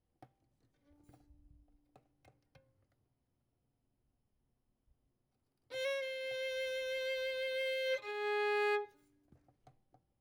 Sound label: Violin; Verdigris Music